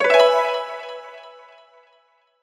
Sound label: piano success notification; audioblocks